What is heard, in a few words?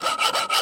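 sawing small branches with a hand hacksaw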